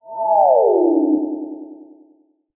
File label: throw; smite; ahpuch skin10